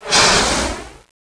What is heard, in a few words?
core steam burst; dm criticality